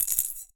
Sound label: Drop In Glass, Medium Wine Glass